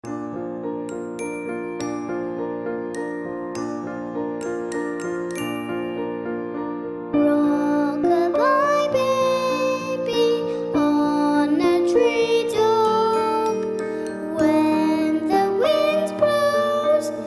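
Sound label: rockabye, musicbox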